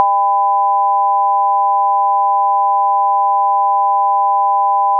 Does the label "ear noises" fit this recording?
Yes